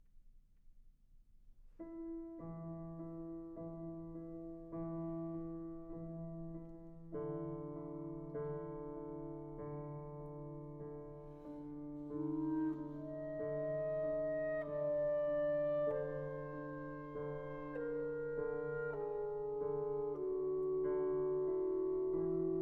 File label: Clarinet and Pianoforte, Andante